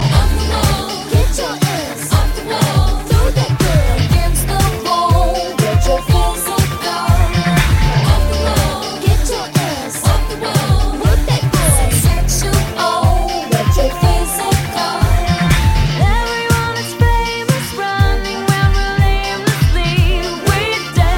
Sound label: retro electro